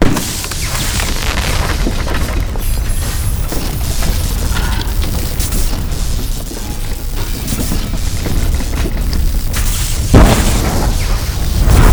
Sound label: chand destruction